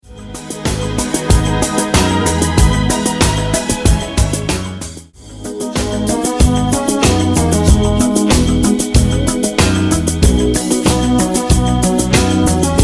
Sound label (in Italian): Groove En Rose Canto